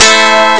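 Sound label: guitar moll; Amiga Soundtracker Sample Packs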